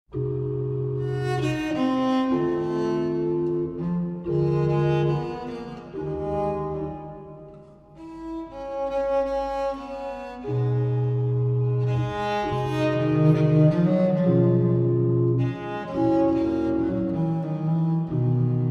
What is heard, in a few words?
pipa